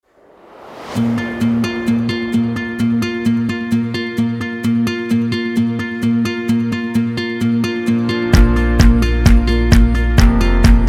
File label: Indie Upbeat; background music